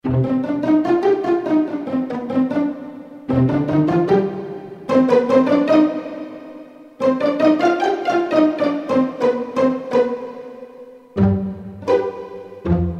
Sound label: Pizzicato String; Kurzweil K2000 Orchestral ROM